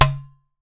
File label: UTAR, Definitive Percussion